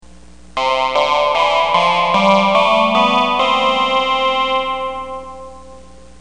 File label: vocal